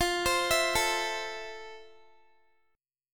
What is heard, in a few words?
F Major 7th, Guitar; arpeggiated